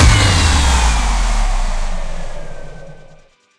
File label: dubstep shutdown, weapons, dubstep gun; garrysmod